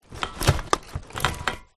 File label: household trashcan rummage cans paper